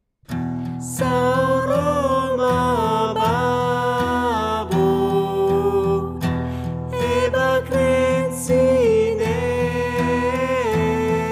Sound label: lead harmo; Ederlezi; groupe vocal polyphonique et a capella de l'Ecole de Musique de Pélu